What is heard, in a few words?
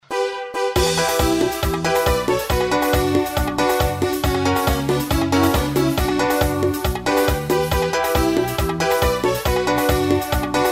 midi